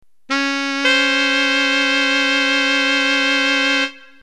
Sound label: Major 7th